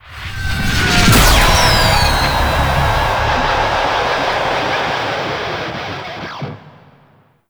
Duet Entrance Stinger; Warframe; Nineteeen Ninety Nine; Lich Duet; Inf Lich

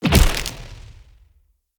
Staff Proj Impact Player; Weapons; Enemy; Staves; Mnd Wazard Staff

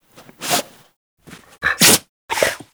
Kubrow Tame Sneeze A; Warframe

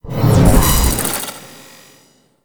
Vortex Deactivate; Trapper

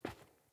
Grunt Boot Walk Default, Footsteps, Boots; Enemy; Sinecure; Melee Grunt

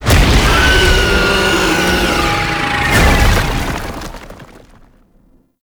Death; Tau; Twelve Minute War; Cinematics; Dactolyst

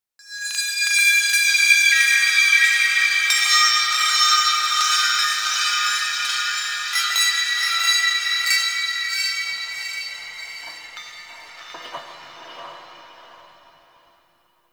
Metal Chimes; Warframe; Ambience; Nokko Colony

